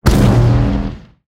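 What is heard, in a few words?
Bazooka Attack; Bazuka 3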